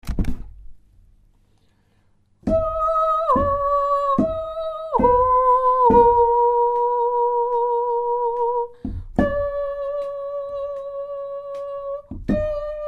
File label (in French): soprano, chorale